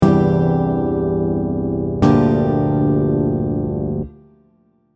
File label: Nine Note Chords